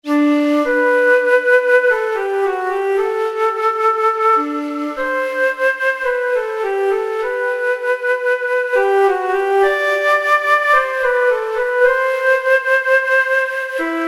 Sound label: Tremolo Flute